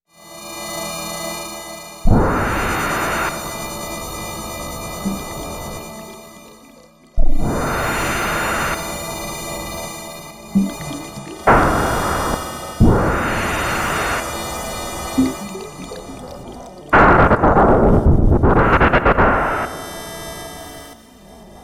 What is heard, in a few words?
energy slice, soundscapes